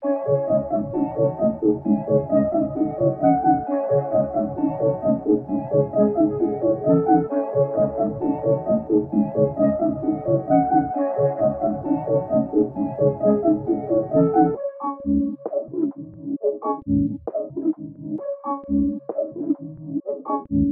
Glock - 132 BPM C# Min; Trap; Cymatics; 2022 Melody Collection; Trap Pt 1

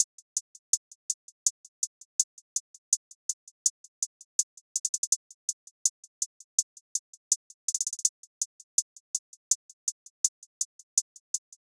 Drum Loop 82 BPM Hihat; Cymatics; Vibes II Drum Loop Collection; Distance Drum Loop

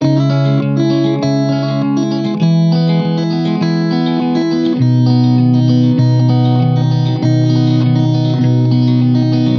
Guitar 100 BPM Cmin, Guitar Loops; Ghosthack; Ghosthack Advent Calendar 2021; Ride It

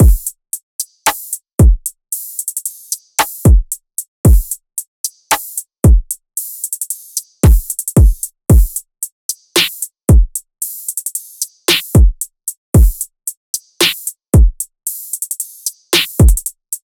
Drum Loop 113 BPM; Bermuda Pt 2 Drum Loop; Cymatics

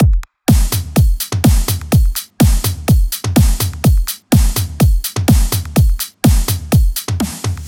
Bass House 125bpm Full; EDM Drums; Drum Kits; Stems; Ghosthack; Drum Mega Bundle; Ultimate EDM Drums; Bass House Kit 10

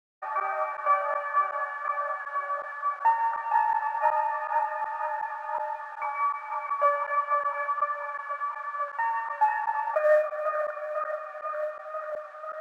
162 BPM F# Min Synth Piano; Trap Melody Loops; Loop Stems; Cymatics; Nightmares Trap Melody Loops

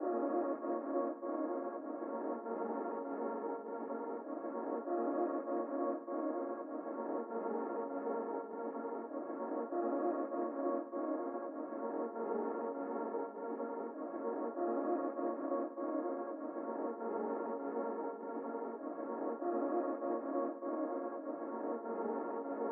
99 BPM A# Min Texture; Cymatics; Feeling Good; Infinity Melody Collection

